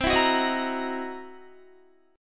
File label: C 7th Sharp 9th Flat 5th C Guitar; strummed; JGuitar